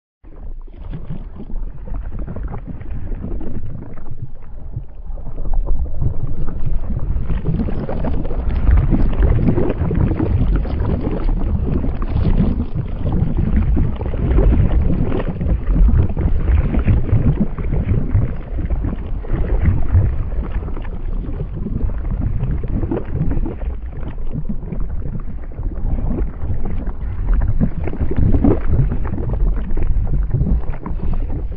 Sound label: lava Flow Sound Effect